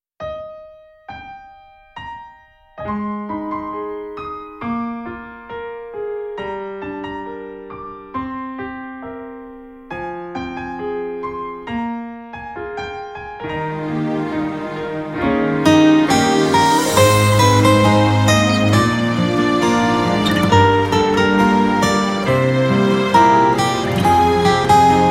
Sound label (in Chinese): 钢琴曲 - 手机铃声